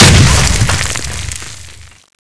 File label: explode